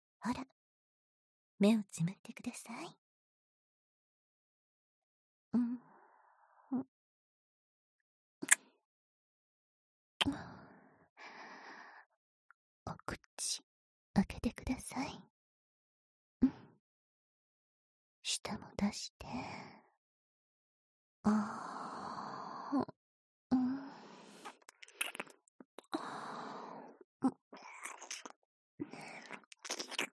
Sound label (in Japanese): たっぷりねっとりディープキス